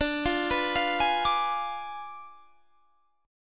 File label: D Diminished 7th D down Guitar Standard; Ddim7 arpeggiated; JGuitar